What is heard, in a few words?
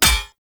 Hammer Impact Parry; Weapon Impacts; Player; Combat